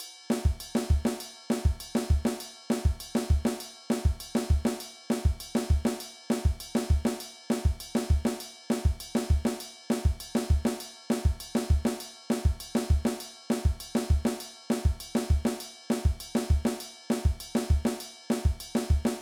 Afro Cuban

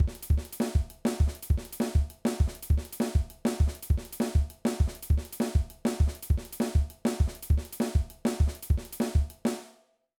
Impressive Beat

